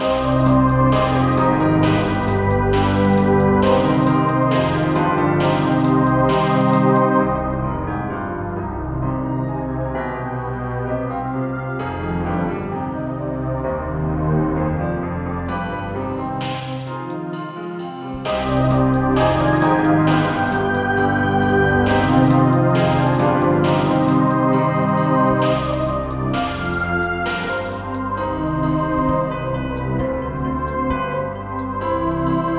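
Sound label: loop, music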